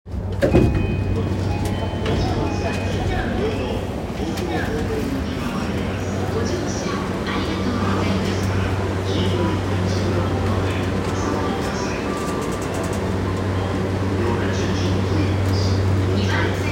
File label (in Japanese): JR 山手線 巣鴨 CCW, train sounds